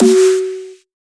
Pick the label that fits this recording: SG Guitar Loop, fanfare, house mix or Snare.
Snare